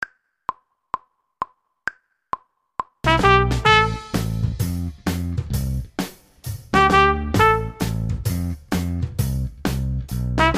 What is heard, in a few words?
trumpet section